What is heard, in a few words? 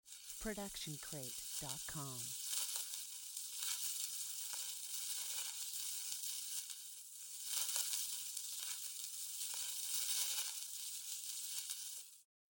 Magic Portal; SoundsCrate